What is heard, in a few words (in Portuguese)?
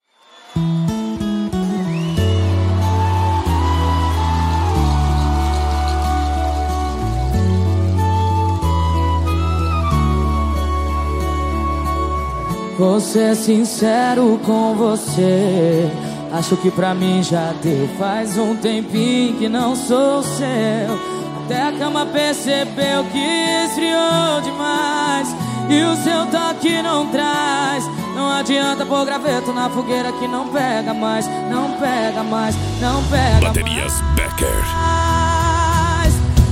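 especial sertanejo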